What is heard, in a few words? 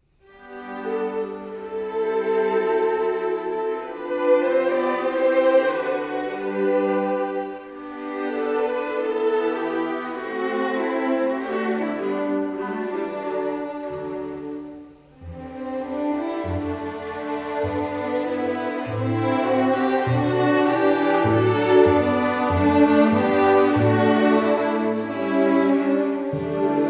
Sarabande